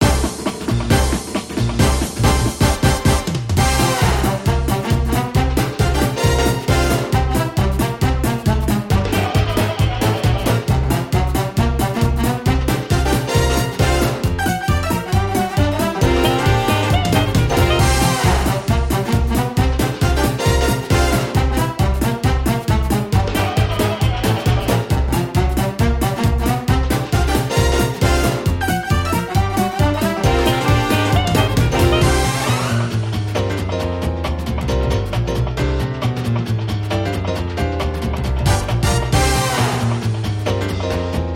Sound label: summer story comical